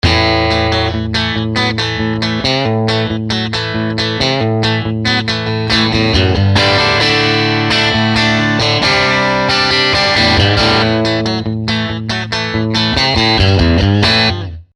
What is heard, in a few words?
59 Bassman Clean; fender